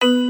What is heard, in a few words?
step; Grand Tiger